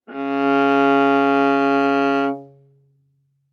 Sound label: Viola arco ff sul C Db3